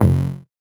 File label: VocoBit Noise, 8-bit; musicradar; 8-bit bonanza